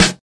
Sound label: snare